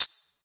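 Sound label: shaker; Western and Latin Percussion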